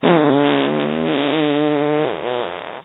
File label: fart long